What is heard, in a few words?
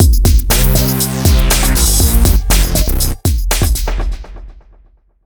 win